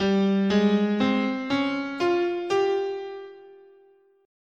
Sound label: G Iwato, scale